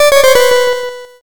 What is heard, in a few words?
lose